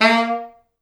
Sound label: A#2, Pop Brass; Best Service ProSamples vol.19; AKAI